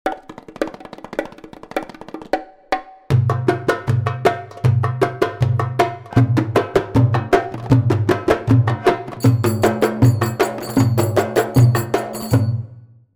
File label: Nubian Reggae